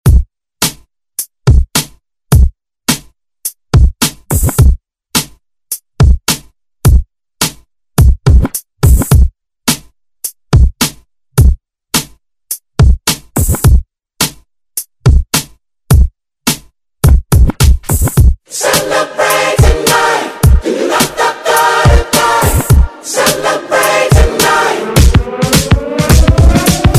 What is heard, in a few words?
Top40 Redrum